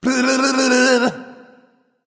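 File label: non-verbal tongue; smite; voicepack; houyi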